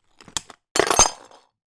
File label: foley glass broken bits movement drop in plastic dustpan; zapsplat